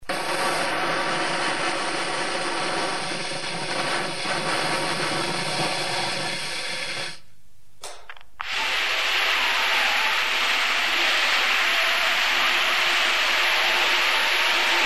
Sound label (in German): rauschen